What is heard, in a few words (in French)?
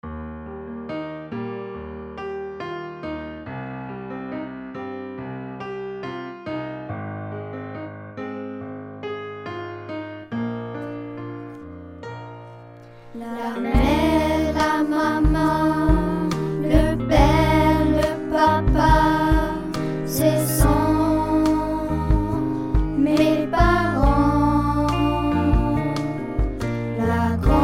ma grande famille vocals